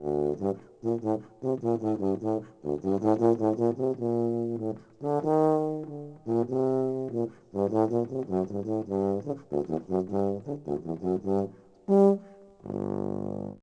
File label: Tuba